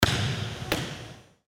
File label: volleyball overhead serve